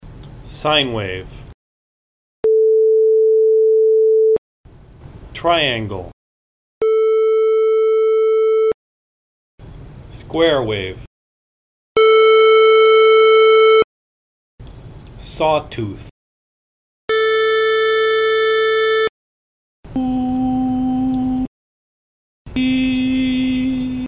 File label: four waves